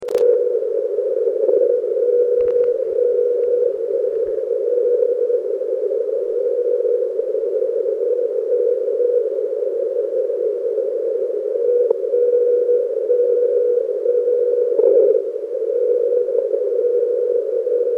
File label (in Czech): majak; SuperQRPtest; Maják 8; Jarda ok1hdu